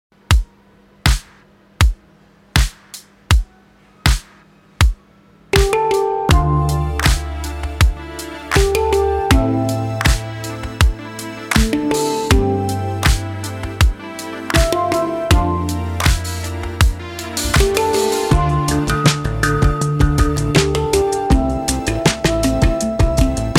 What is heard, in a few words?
Handpan Rain